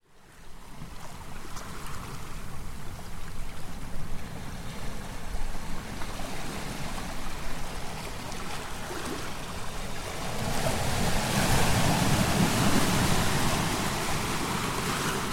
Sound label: olympic national park 3rd beach waves over tide pool rocks; shure wl183s